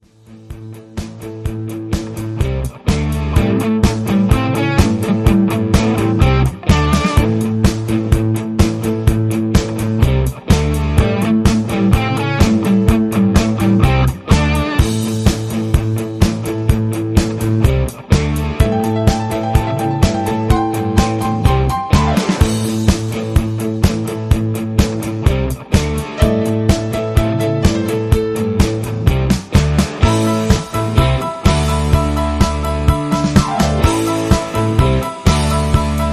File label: Hoedown